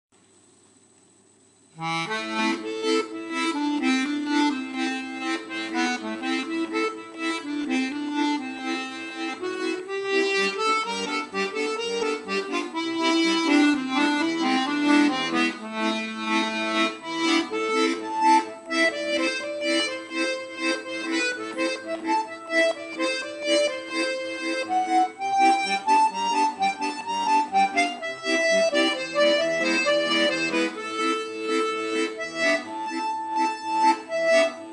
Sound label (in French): Sher Traditionnel Klezmer